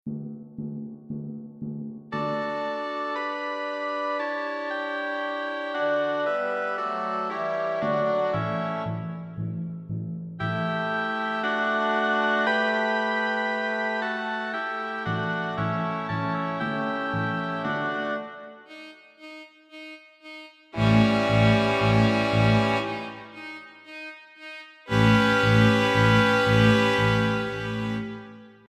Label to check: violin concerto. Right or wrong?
Right